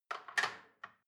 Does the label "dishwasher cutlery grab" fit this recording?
Yes